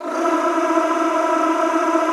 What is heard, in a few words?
E3-R, Extended Classical Choir; Best Service